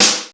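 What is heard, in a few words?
ambient snare, drum; Crypton - DrumBase5000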